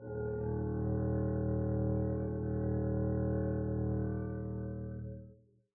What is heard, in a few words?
arco eb1 p up, double bass